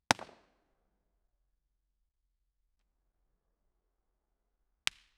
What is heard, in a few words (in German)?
ein pfeil wird von einem, bow